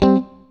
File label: STRAT, GUITARS; Synclavier Sampler Library